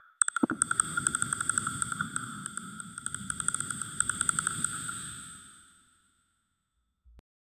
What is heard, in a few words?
vampire transforming into a bat; supernatural